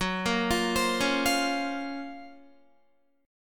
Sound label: Gb Major Sharp 11th, Guitar; GbM#11 arpeggiated